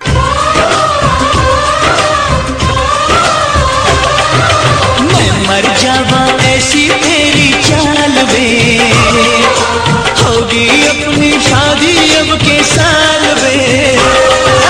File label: Hindi Song Ringtone